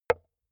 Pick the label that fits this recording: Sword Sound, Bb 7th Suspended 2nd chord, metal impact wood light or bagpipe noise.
metal impact wood light